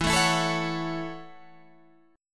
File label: F sharp Minor 7th